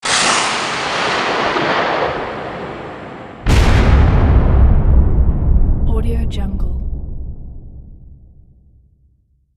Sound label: war rocket launch and big explosion